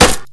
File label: wood box impact bullet; physics; Counter-Strike Global Offensive